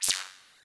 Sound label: Swoosh